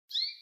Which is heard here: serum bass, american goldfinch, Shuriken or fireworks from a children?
american goldfinch